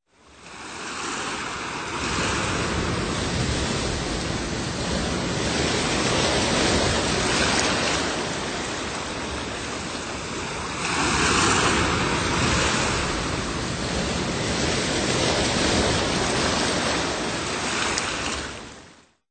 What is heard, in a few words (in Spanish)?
olas; biosfera